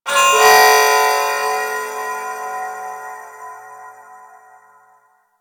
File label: Temple Moving Bells; Warframe; Corpus Ship